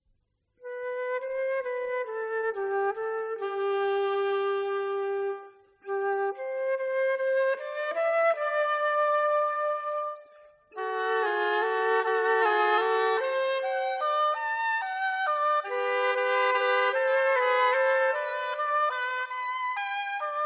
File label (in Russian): Колыбельная; В. Моцарт; Детский музыкальный альбом